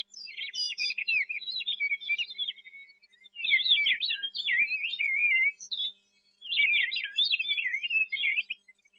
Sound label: Western Meadowlark